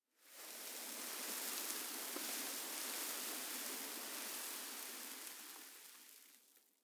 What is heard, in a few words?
sand gust; dust2